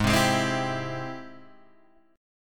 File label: G sharp Augmented Major 7th G sharp Guitar Open G